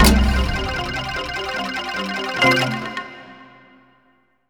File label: Percussion Combos; M-Audio; Pro Sessions VOL-38; Abstract World Fusion 2